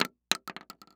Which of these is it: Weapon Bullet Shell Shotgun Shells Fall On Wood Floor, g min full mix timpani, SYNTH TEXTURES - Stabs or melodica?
Weapon Bullet Shell Shotgun Shells Fall On Wood Floor